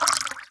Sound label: Fluid Impact Soft; Puddle; puddle plus ios gamerip 2018